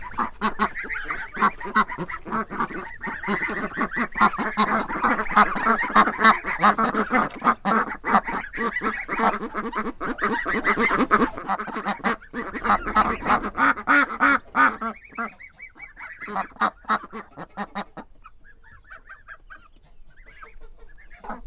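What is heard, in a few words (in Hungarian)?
nagyok és kicsik, házikacsa; állathangok; paraszt udvar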